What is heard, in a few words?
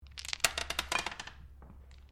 Dice Small Pair Glass Table Throw Fall Floor; Variety of dice sounds; Casinos